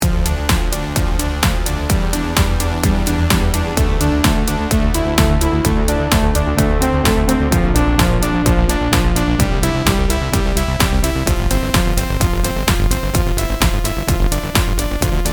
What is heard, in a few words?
acid rain; bluewave